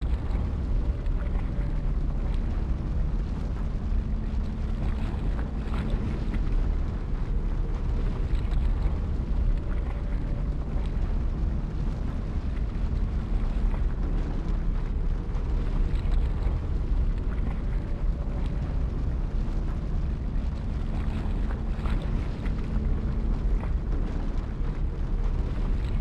Temple amb; Kali Temple

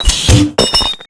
Weapons Minedrop

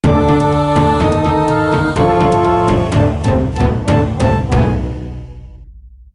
Dangerous world warning

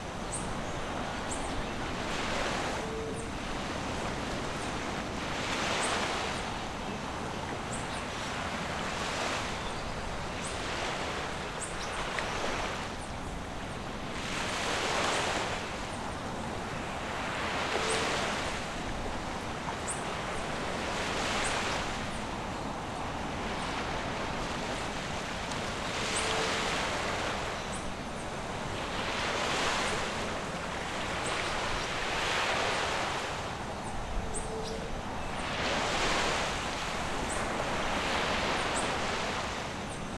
amb island edge; spoons island edge

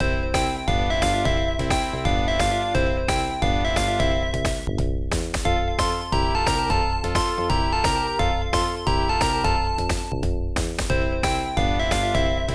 Ranking High scores; Marble Cooking; MS-DOS

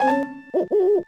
Snowy Owl; Animal Genius

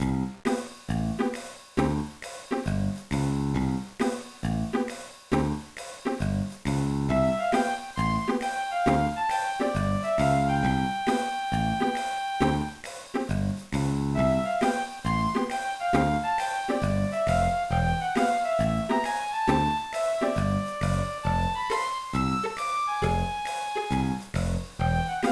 BGM; card captor sakura sakura card hen sakura to card to otomodachi; game boy advance

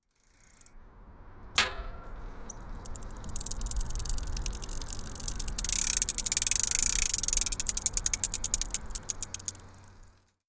Bike Oil

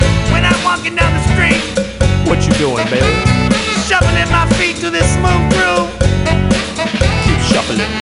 Cowbell; gamerip; Happy Feet Two; nds

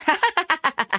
Laughing; Devour 2021 gamerip pc macos

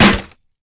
break; Angry Birds Space

